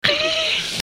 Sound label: quiver